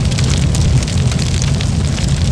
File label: fire loop; Tony Hawk's Project 8; XBOX